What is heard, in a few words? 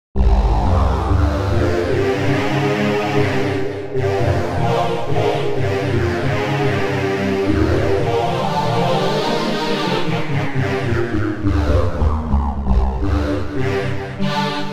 samarium pad; the space soundset